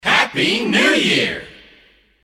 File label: christmas kit group shout happy new year